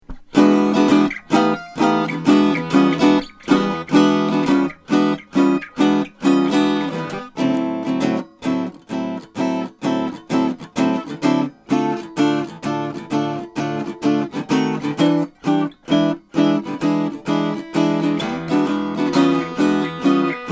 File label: daquisto dq solo natural